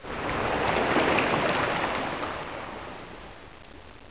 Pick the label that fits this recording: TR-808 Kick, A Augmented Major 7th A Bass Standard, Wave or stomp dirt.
Wave